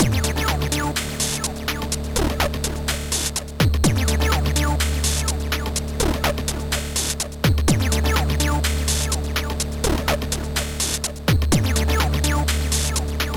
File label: blues; Trackers; Modland; Muffler